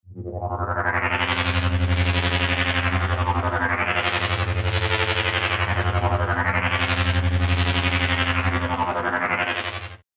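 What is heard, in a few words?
174 atmos, Dark and looming; Tech Flavours; Drum & Bass Sample Pack inspired by the sub-genre of Tech Step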